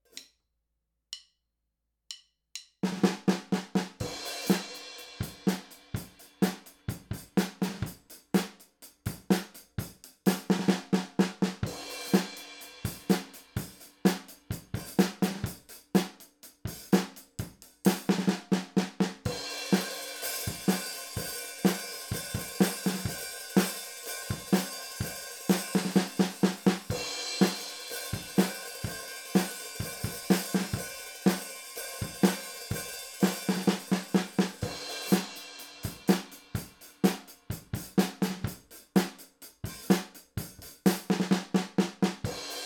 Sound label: drums; mic tests